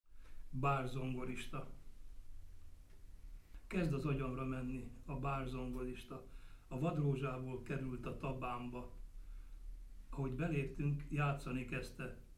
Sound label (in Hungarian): barzongorista